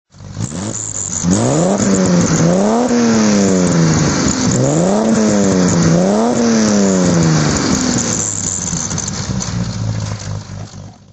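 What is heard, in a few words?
triumph tr6 pi throttle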